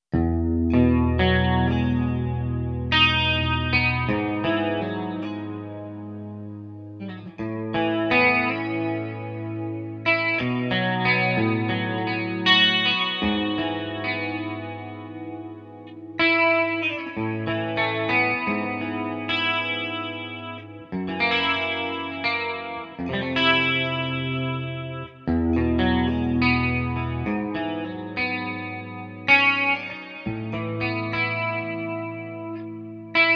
distant guitar

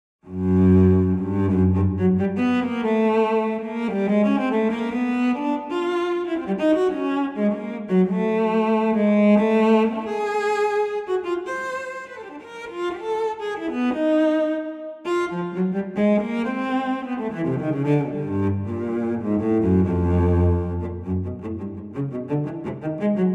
Cello Improvisation; Sample Modeling

